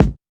rich mids bass drum one shot a key, Royality free kick drum single shot; kick drum samples